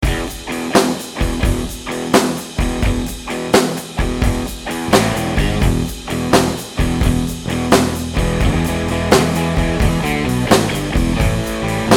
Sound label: 12-8 blues; songkits; Mixcraft